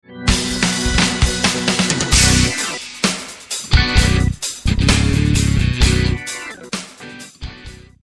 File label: Drums Groove; Drums Vol.1